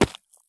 sprint gravel; Escape from Tarkov

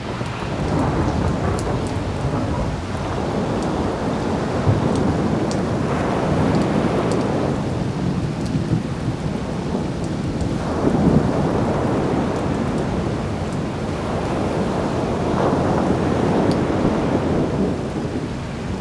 ambience harbor

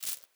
ui drop seeds; Rust

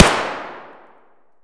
tt fire indoor distant; Escape from Tarkov

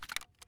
fal stock close; Escape from Tarkov

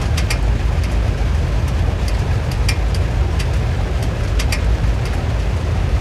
damage; World of Tanks

